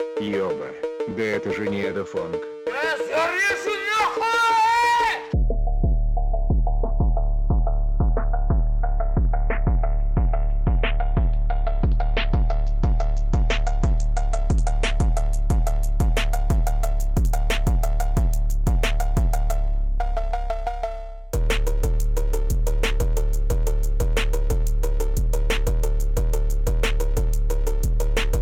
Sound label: phonk